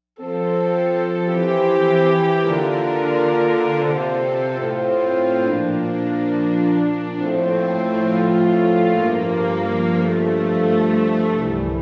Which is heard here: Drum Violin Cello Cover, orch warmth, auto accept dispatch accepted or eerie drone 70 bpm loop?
orch warmth